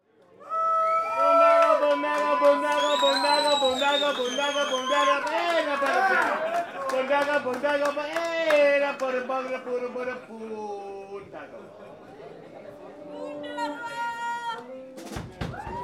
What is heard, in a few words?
chant